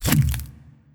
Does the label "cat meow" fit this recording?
No